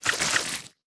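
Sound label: Action long range throw cream; The Denpa Men; Android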